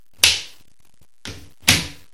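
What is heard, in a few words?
shower door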